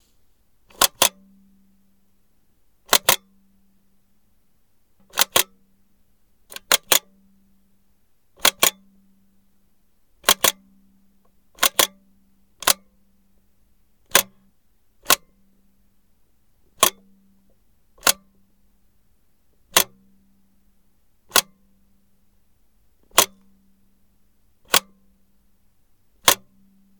camera shutter mechanism, Old Cameras; mono 192kHz; Soundholder

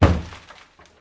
shutter; hl2

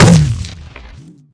footstep, strider; hl2

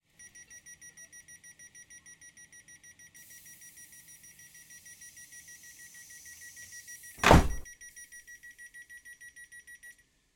Train Mech Train Door Closing Beeping Distant; InspectorJ; 96 General Library

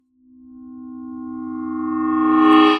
Reversed Metal; Mechanical Wave; Torturing Metal

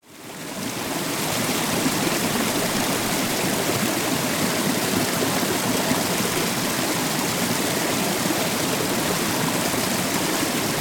Small waterfall (close perspective); Water Flow

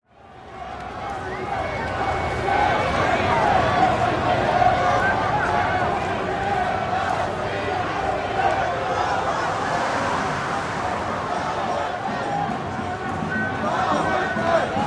South African Ambiences Protests; TheWorkRoom Audio Post

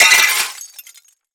glass impact break falling debris; BluezoneCorp; Broken Glass

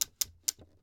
Coffee, Grinder, Knob, Turn, Click X3; UberDuo; The Home Barista

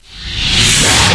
electric light; hl2

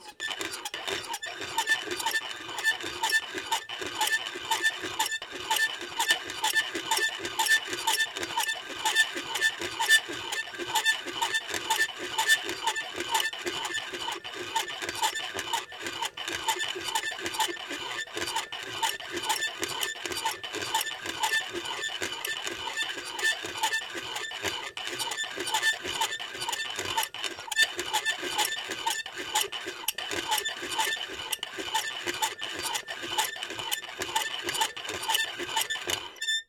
Antique Grinder Wood Open Fast Speed; The Soundcatcher; Antique Grinders

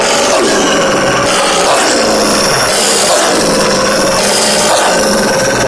growl high, antlion guard; hl2

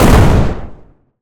explosion large no tail; Gamemaster Audio; Explosion Sound Pack

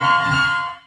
string; hl2; window script

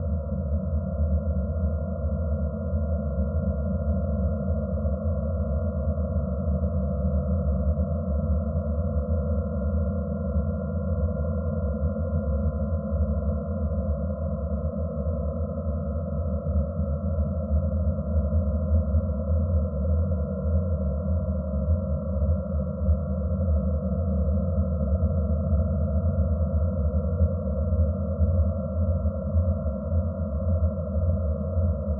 Interior Tones, Abstract; Digital Rain Lab

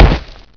vort foot; hl2

